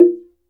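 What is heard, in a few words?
Slit Drums, soft; Roland LCDP11 Africa VOL-1; Wood & Metal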